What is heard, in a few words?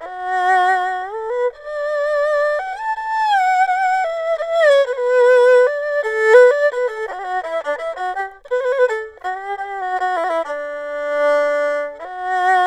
ERHU, 80; INIS - Opium